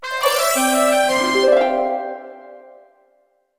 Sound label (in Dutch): Trompet; the blob de blob utrecht